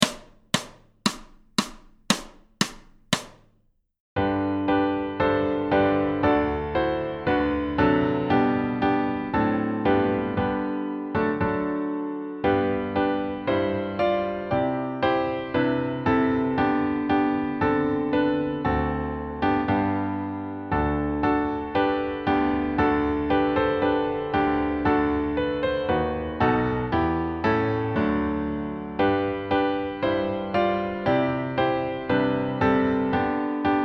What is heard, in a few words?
Snare joyful accomp; Level 4